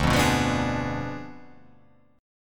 D Diminished 7th D

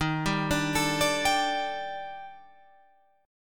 Eb Major 7th Flat 5th, Guitar Open F; EbM7b5; arpeggiated

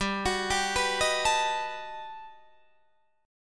G Augmented Major 9th, Guitar, Open D

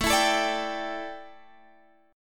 A 7th Suspended 4th, chord; A7sus4 strummed

